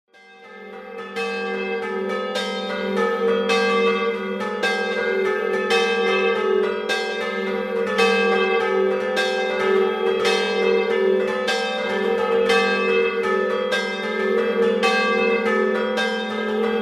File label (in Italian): scampanio muina, campane